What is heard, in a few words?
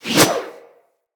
Axe Small Swing; Weapons; Swings